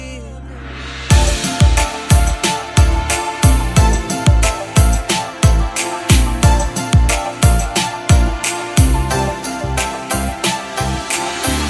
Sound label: Message Ringtone